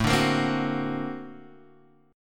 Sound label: A sharp Augmented Major 9th